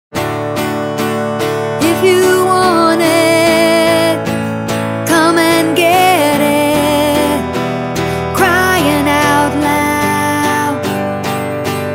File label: tenor